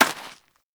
Rodent Hop Step Rear Dirt, Footsteps; Forest Rodent; Foley; Warframe; Characters

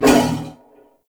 Wolf Attack Short; Animals; Duviri

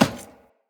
Heavy Grunt Boot Walk Metal, Footsteps; Sinecure

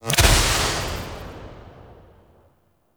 Impact; Warframe; Tenno; Grimoire

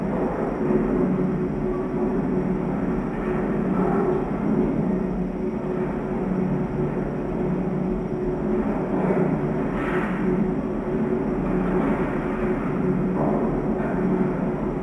Spinning Cogs; Warframe; Jackal

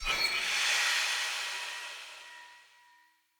Great Sword Recall Button Push; Weapons; Player; Swords; Mendicant Great Sword

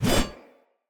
Spear Whoosh; Weapons; Cleansed Spear

